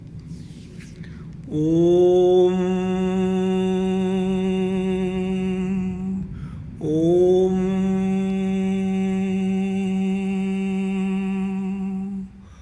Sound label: closing chant aum